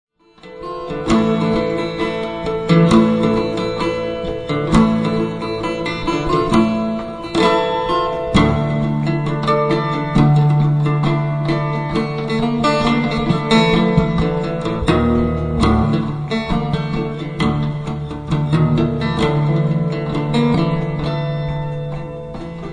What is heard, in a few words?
string bazar